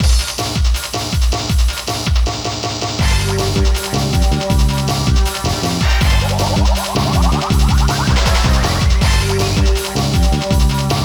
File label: Bonus Stage Car Break; Street Fighter III 3rd Strike